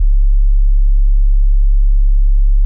Bass Sub C#0, Synth

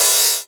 Hihat Open 80s; One Shots; Drums; Spacecraft

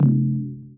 E-Perc Ring Shift, Electronic Percussion; One Shots; Drums; Moon Golf; Ableton Live 12 Lite; Core Library

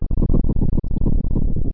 Thunder; Open Zelda; Quest Designer 2.0.4b

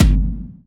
Tom; Ableton Live 12 Lite; Core Library; Drums

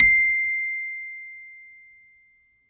Celeste C6; Mallets

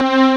Synth Strings; SPCTool

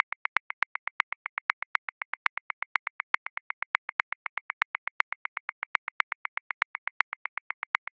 Reggaeton Loose Accent 16th; Grooves; Ableton Live 12 Lite; Core Library

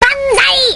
flag get; Digital Paintball 2

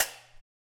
Rim Hihat Combo, Drums

